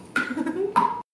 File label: tick tock chuckle